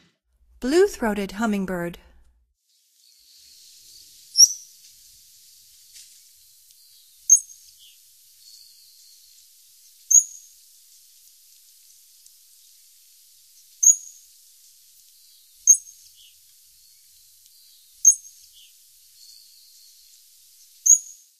Blue-throated Hummingbird, Songs of Birds of North America; Stokes